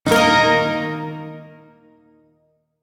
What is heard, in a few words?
Shawzin Chromatic Chord G; Warframe; Duviri Shawzin